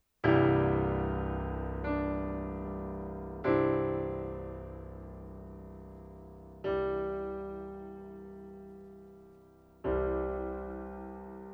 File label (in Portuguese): Piano Base Loops